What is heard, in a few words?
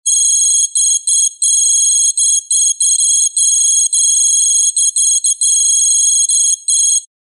telemetry data display